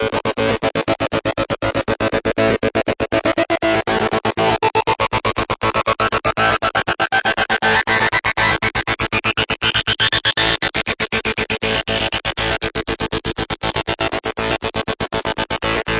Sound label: ripping arp phase synthline, synth and electronic loops